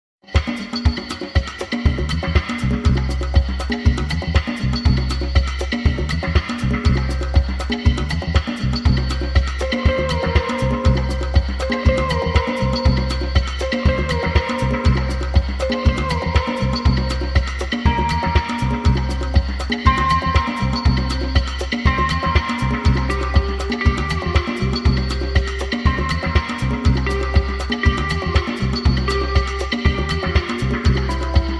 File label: Dance Groove